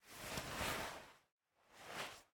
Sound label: Player Take Console; MiSide